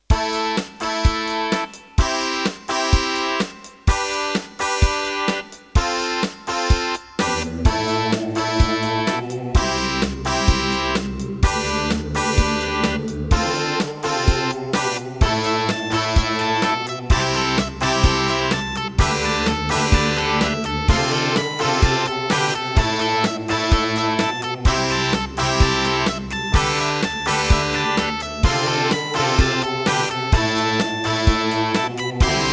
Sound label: Serdaco Dreamblaster X2GS NATIVE